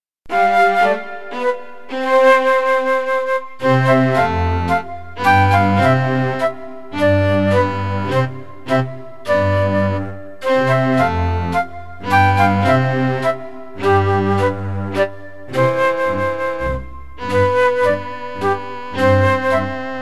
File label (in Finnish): Menuetti